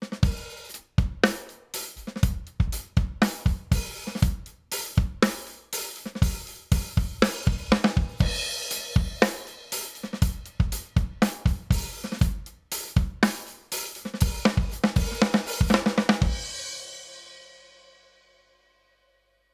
Drums 4 zu 1 max. GR 7 dB 600 Ohm; Golden Age Project Comp 554 MK II

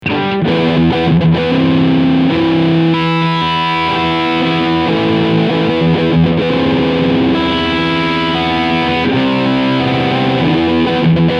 Riff Sound Steg Pickup; Ibanez PGMM11

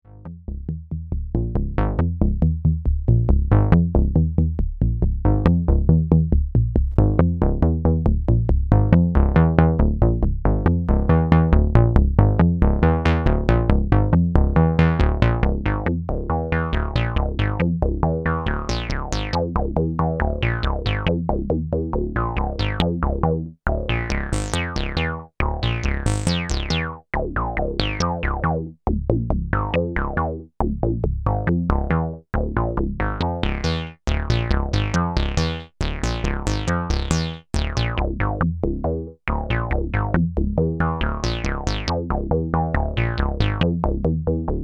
Original M15 Sequenz

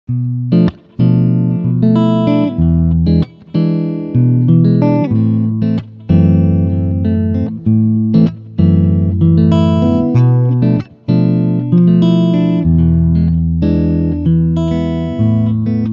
Ballade beide Humbucker

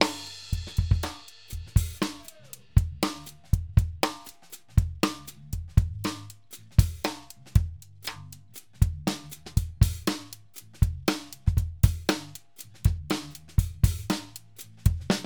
Subgruppe Clear Bus

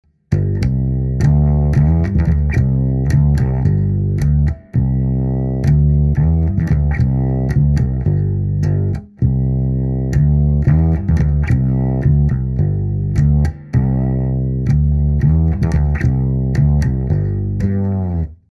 Mikrofoniert Fretless Max Comp; TTE500

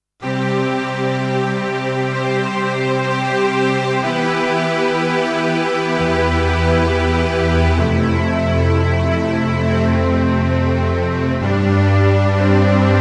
Korg D1 Streicher

